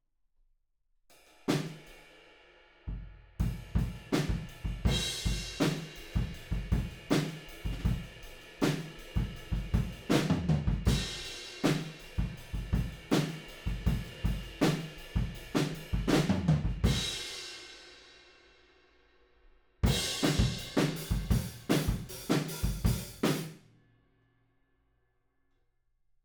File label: Room, 160 BPM